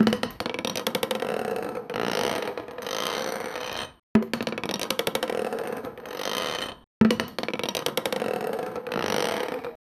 ball rolling on smooth wo; tabletop

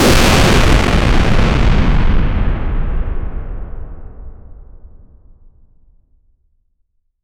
big bang explosion

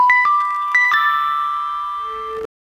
short catchy jingle for m; brand

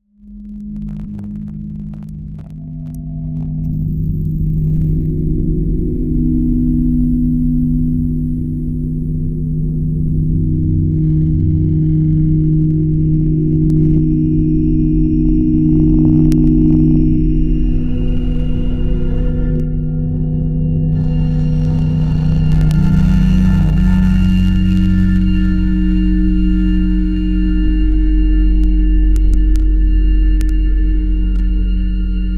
dark ambient drones

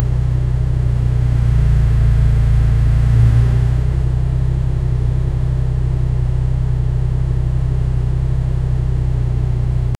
car turbo spool sound

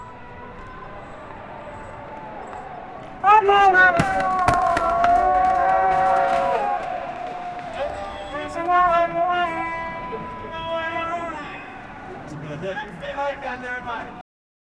crypto arenas gates openi, arena

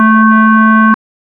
create me flashlight low, warning

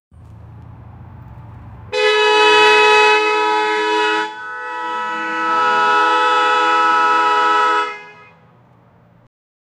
new modern mercedes truc

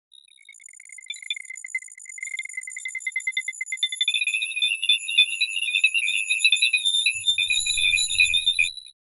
golden particle, particles

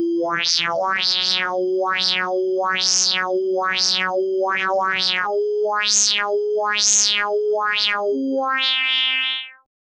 funny cartoon losing soun, wah wah

